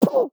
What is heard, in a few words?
player hit; fallguys